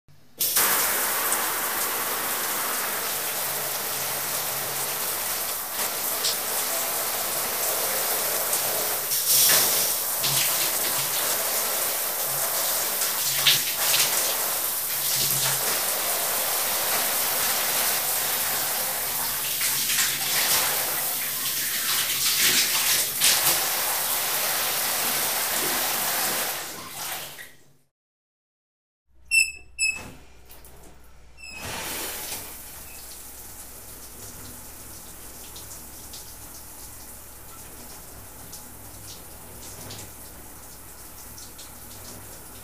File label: Water flows in the shower